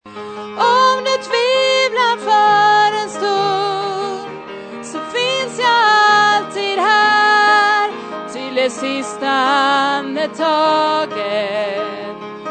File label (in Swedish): sopran